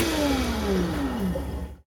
grease disposal winddown; M8; percussion and foley

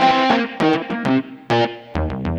Metafunk, Leads And Riffs

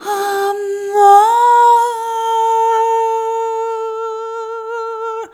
Rhythmic G

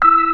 E4 FAST, DISTORTN.B3; Northstar - New Gold VOL-1

